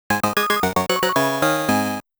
Game Over MUSIC; Motos

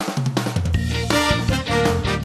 reward music intro

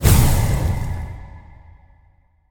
light rune explosive start

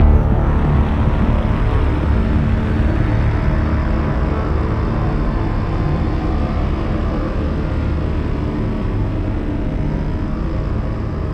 Linear - Shock; batman the enemy within

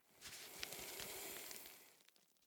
Lizard Scuttle; The Town; 3D Environment

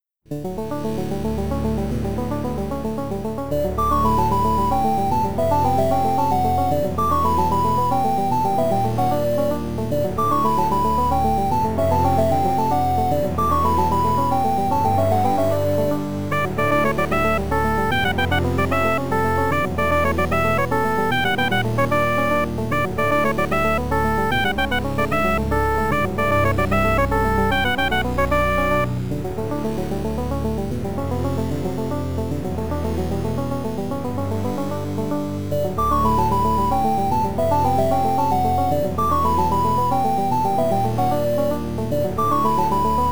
8bit; Hyrule Warriors Definitive Edition

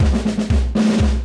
Military Advisor Sting; Civilization Revolution

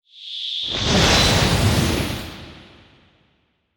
gate

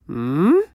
Emote Male Confused; Idle Champions of the Forgotten Realms

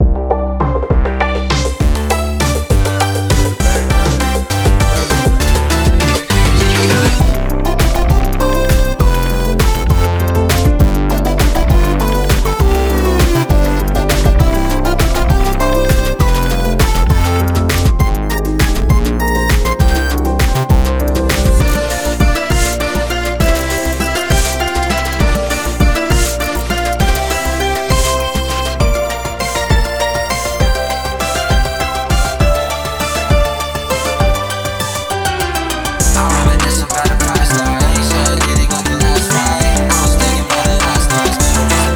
Cloudy RV Park (Instrumental); SGFR presents Super Star Symphony 2021

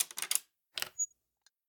Lockbox Unlock; The Town

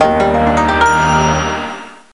Chinese Win; mystery tales time travel 2010 nds gamerip

